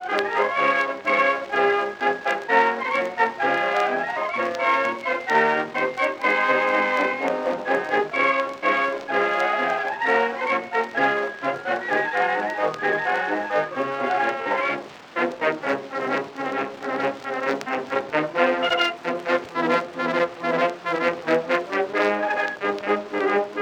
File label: The US Field Artillery march; loc-edison